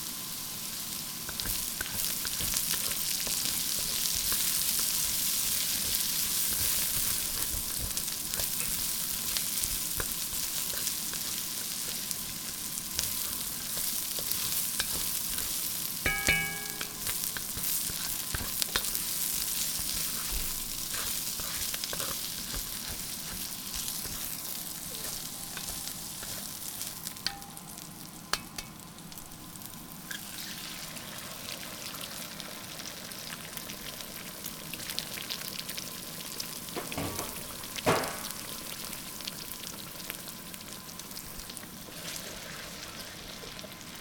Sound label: Stir Fry